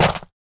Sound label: stomp ice; Nut Boarding